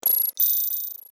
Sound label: neurointerface out